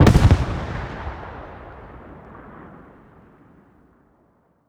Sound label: med dyn, explosions; gmod; lvs framework